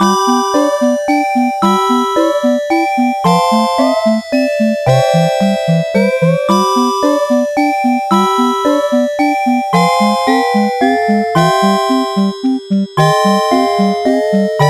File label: Winter Village Loop